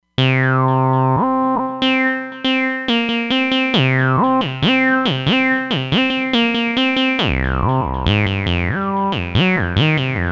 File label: TB-Sequence, Roland Fantom XR